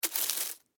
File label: Insect Dig Foliage, Leaves, Bug, Movement; Animal